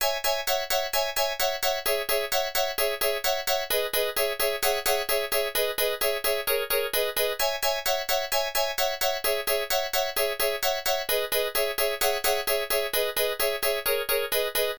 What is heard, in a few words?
Piano